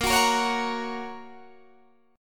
A sharp Minor Sharp 5th, Guitar Standard; strummed; capo 3; 18,21,20,18,19,18

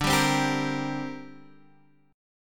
D 7th Suspended 4th Sharp 5th D

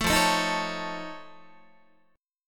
F Augmented 7th F Guitar, chord; F+7 strummed; JGuitar

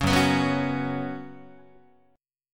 F sharp Suspended 4th, Guitar; strummed; F#sus4

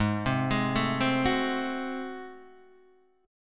Ab 7th Flat 9th Guitar Open D, chord

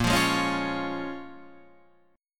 C sharp 6th A sharp

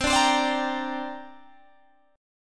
C 7th Suspended 2nd C down Guitar Open G

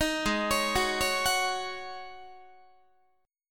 G sharp 7th Suspended 4th G sharp, Guitar; G#7sus4; arpeggiated

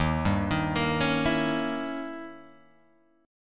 Eb 7th Flat 9th, Eb, Guitar, Open G

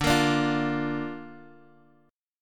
D Minor Sharp 5th, D, down, Guitar DADGAD

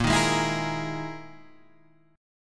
E Major 7th Flat 5th chord

